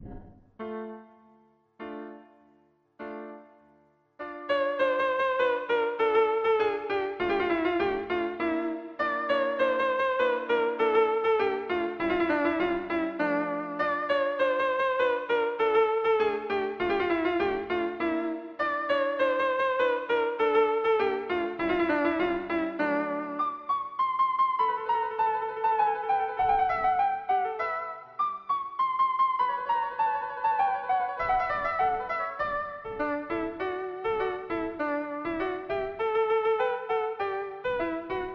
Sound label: habanera, string quartet; bizet